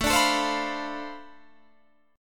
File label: D Minor 13th A